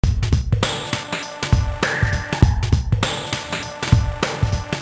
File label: depressing rock music